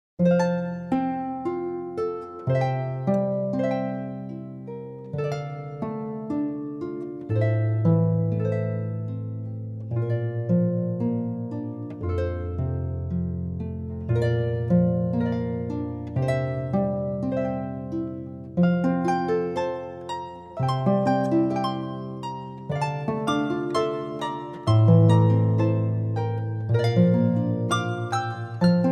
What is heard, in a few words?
Processional, Harp; Pachelbel Canon